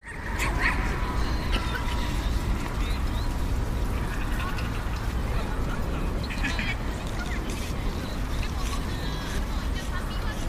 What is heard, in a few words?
park